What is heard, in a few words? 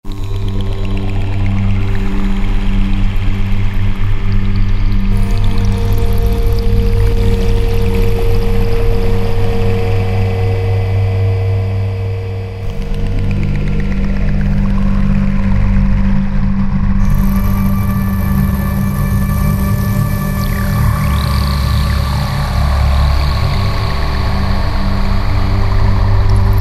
Roland JD-990; Factory Patches examples; As soon as